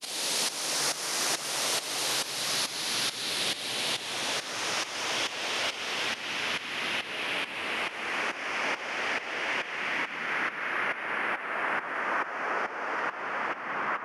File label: Sweep Down Long 138 BPM; Sound FX; Bminor